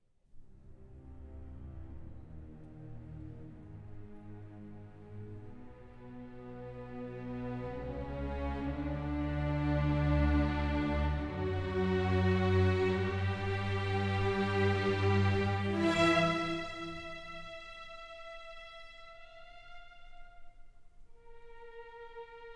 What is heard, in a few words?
Divertimento, for orchestra; Bernstein; Sphinxes